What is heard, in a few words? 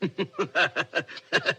laugh